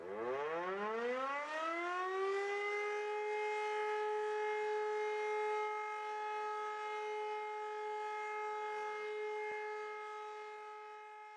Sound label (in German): entwarnung ton